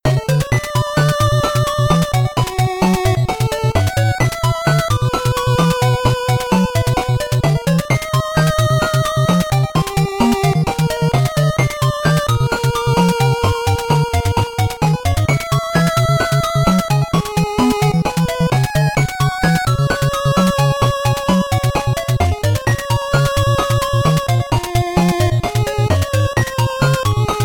BGM 8bit; Mighty No. 9; 8-bit soundtrack

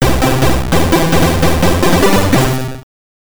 Checkpoint

